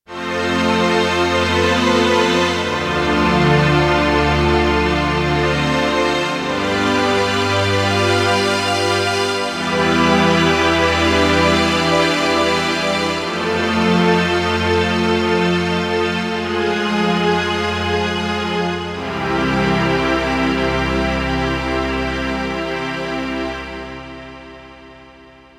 Fat Strings; Kawai K1; Bank 2 card A

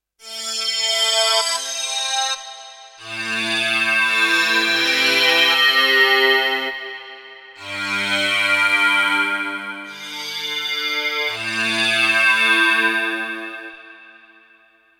Phazed Buzz; Kawai K1; Bank 2 card A

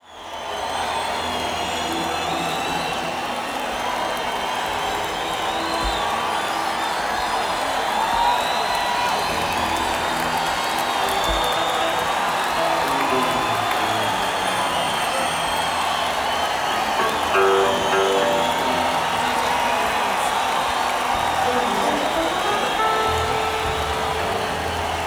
Crowd Tuning; senn421

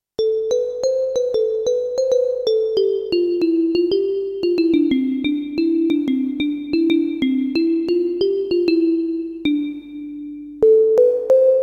Knock Wood, Kawai K1; Bank 2 card A

